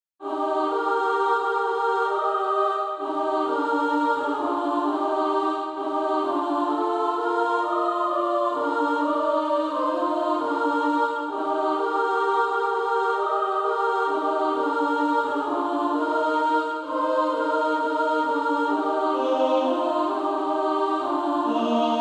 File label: Female Choir SA; A Child of A King